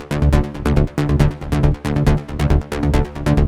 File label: Bassline 138BPM WET, D minor; Vengeance Samples; Nightfly Kit